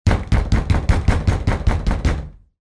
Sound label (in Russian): v derevjannuju dver; Звук стука в дверь для сказки; Для детей, для детских постановок